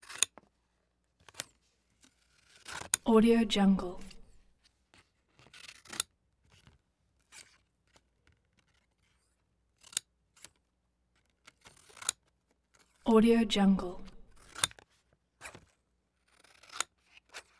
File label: scissors cut pictures; collanderson